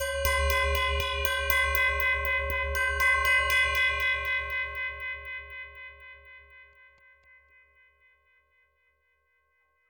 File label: C Singing Bowl